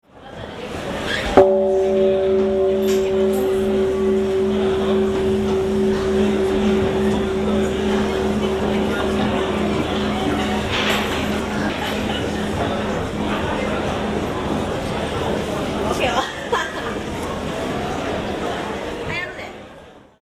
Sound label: takamatsu gong